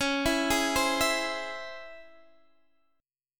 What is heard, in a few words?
C sharp Minor Major 7th C sharp Guitar Open D